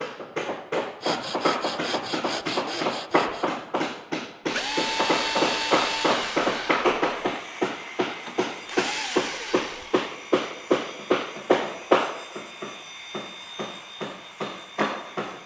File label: repair; Buildalot